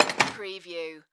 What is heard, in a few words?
Tool Set Down Toolbox; sound effects bible